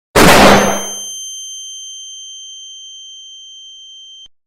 flashbang; Meme

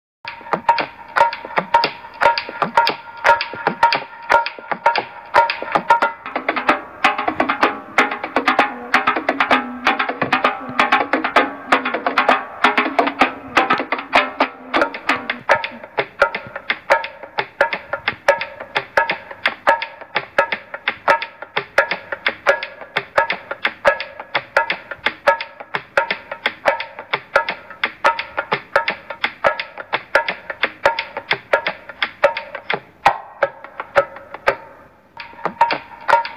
Dhol

